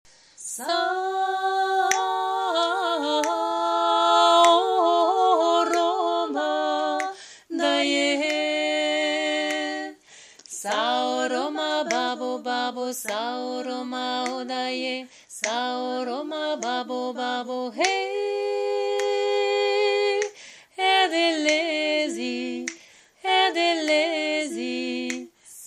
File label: basse